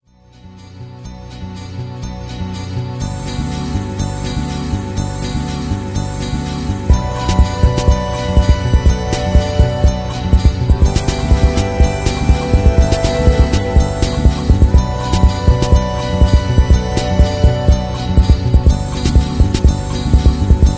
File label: Drum Tango